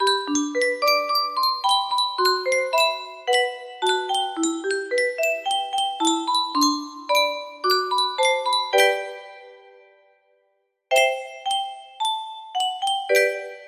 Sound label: custom tune music box, music box melody